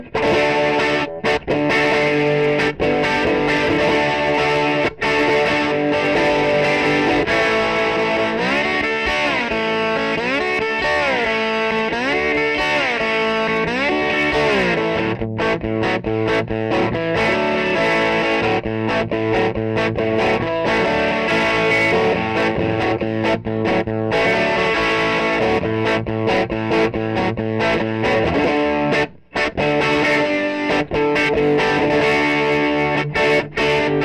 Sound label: Happy